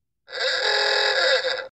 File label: Ahh-ooo-Gah Horn